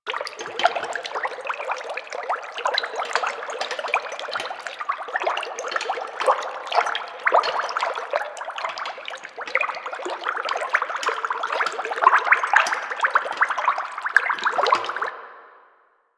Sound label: Bubbling Water Tank Loop; Norg; Ambience; Props; Warframe; Entrati